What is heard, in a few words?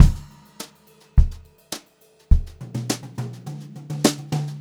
Brushed Drum Loops; USB Soundscan vol.39; AKAI